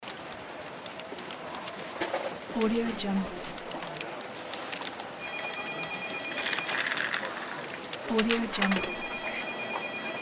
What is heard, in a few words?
office ambience